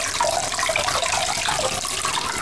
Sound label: water spill